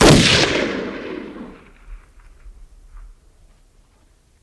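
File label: Gun Shot Single Shot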